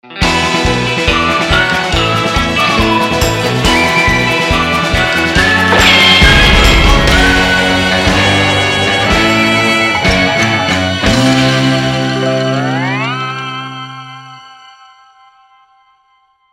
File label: other ringtones music cutscene with fx; netent